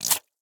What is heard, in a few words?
Syringe Liquid; Medical; Blastwave